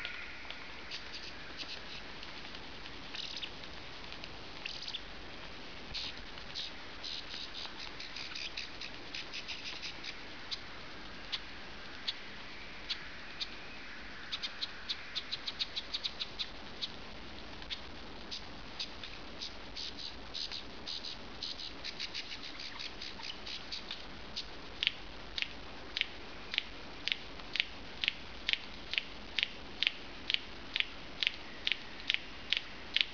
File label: male lyrebird imitating a mobbing flock during copulation; This audio clip is an example of a male lyrebird imitating a mobbing flock during copulation